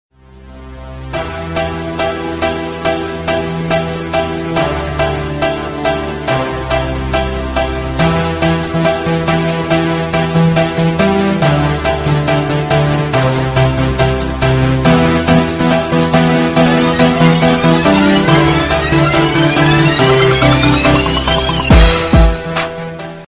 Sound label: Slow Rise